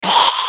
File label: mon scream